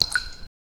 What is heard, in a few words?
cave